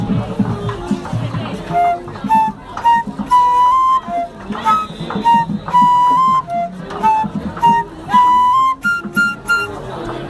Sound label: go big red flute